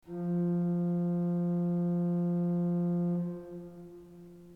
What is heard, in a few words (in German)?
ton, orgel